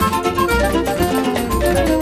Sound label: mus cage forcefield; jungle world